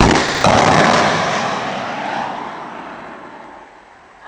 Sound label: j launch; new javelin sound